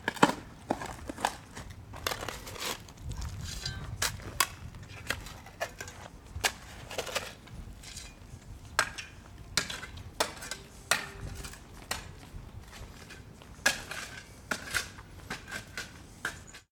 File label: Shovel Digging